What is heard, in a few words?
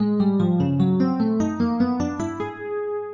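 12-tone row, Inverse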